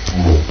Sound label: humpback singing descending moan; inland WA BC; HB singing